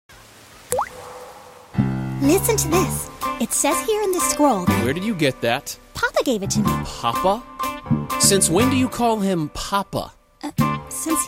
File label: Rain Song II